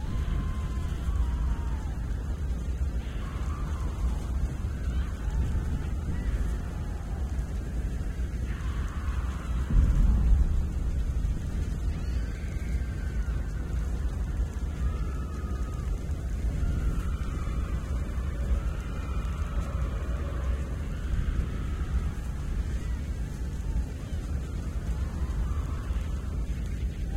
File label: Purgatory Ambience Loop; The Town